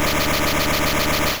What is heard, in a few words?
Supersonic; Pokemon; Gen 1; attack moves; RBY